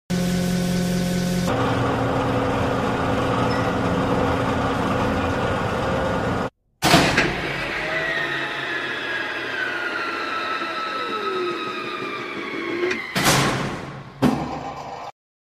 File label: Drilling rig